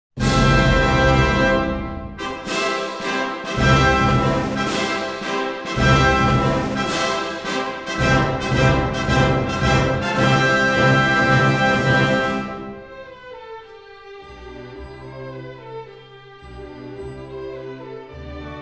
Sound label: democratic congo, national anthem